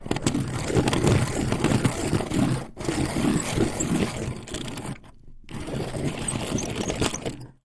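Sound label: Metal Grind Circular, grinders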